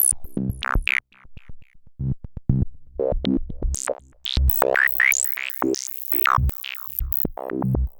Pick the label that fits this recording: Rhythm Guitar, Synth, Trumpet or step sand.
Synth